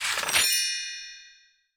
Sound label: bet slip close win; netent